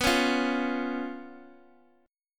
B Suspended 2nd Flat 5th B Guitar Half Step Down, chord